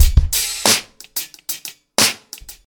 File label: 90 bpm breakbeat sample d key, Free drum beat - kick tuned to the D note. Loudest frequency: 3935Hz